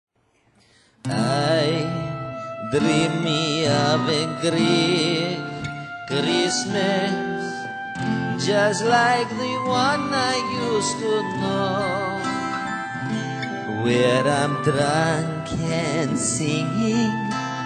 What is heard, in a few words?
Greek Kreesmas; super Greek Music